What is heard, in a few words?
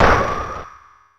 Moderate Hit; Super Smash Bros